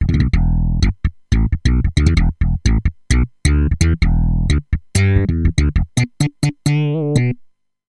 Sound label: Super Bass